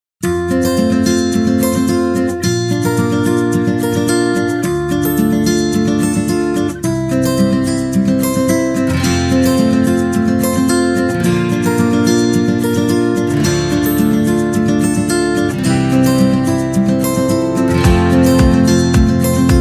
Inspired Acoustic, happy music

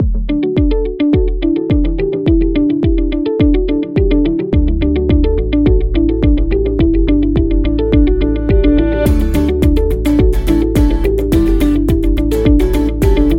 Business Guitar, corporate music; corporate music vol 7

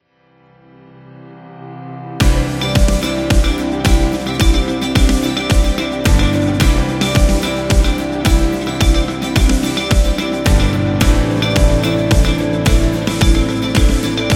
Educational; corporate music vol 22

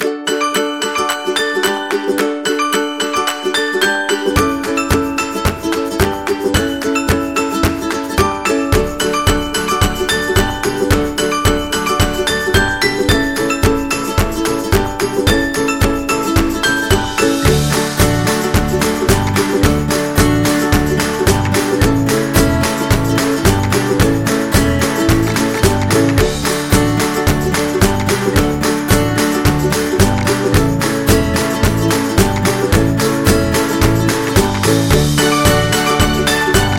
Background Folk; happy music vol 8

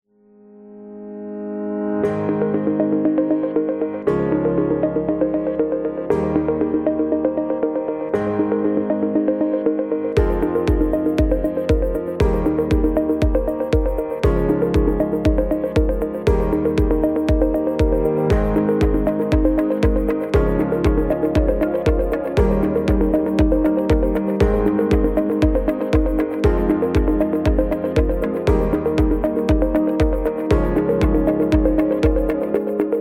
Tech Corporate; agency promo

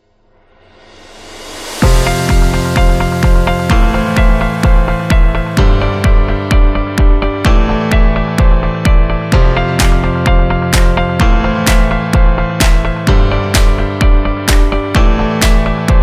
Corporate Success

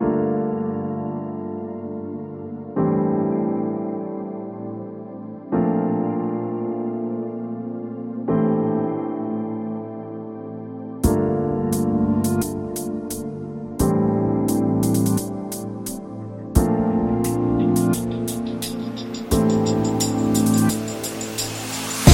Luxury RB

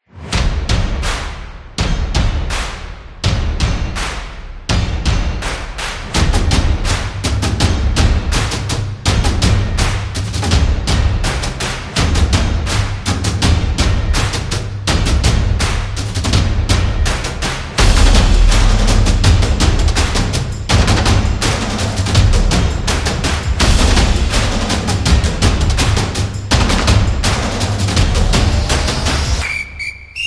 Soccer Football Music

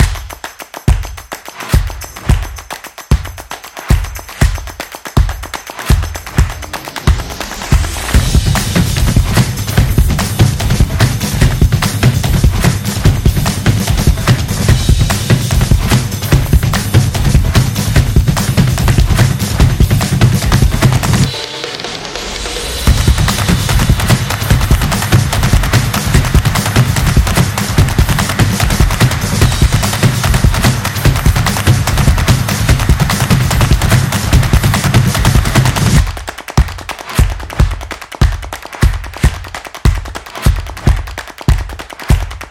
Fast Beat Music; event promo

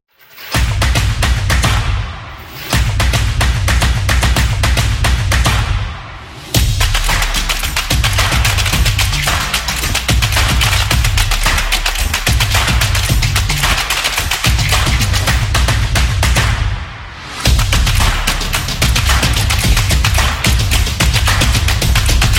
Drum Action; stomp and clap

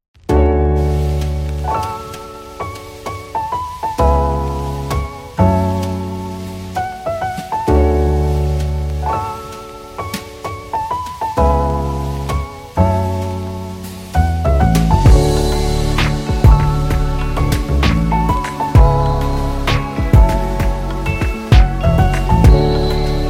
Lofi Chill Beat

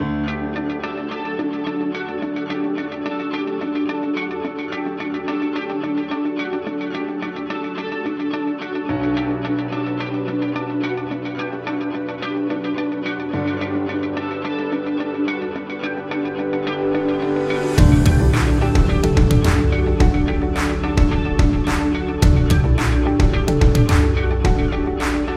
Inspiring Corporate Rock

